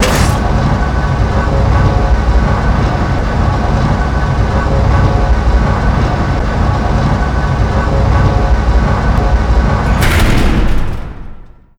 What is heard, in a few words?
car lifter